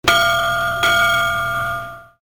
ship bell

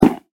bam

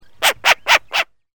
short eared

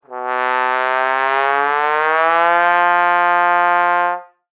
trombone

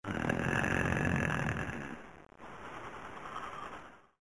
snore

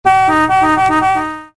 Car Horn

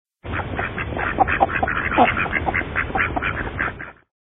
duck pond

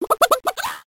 chicken

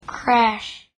crash

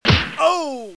Hit Punch

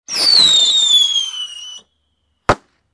Fireworks Whistle

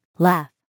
laugh

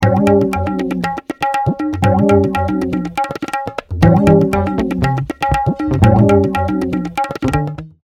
Tabla Beat full

